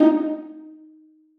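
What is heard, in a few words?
violas pizzicato d#4; Sonatina Symphonic Orchestra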